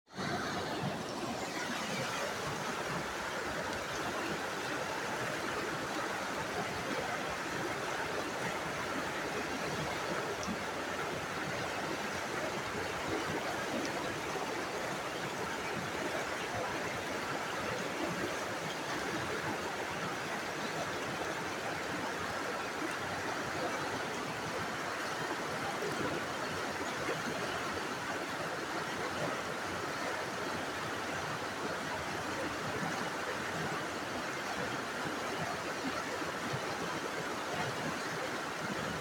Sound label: falls sledding hill; berea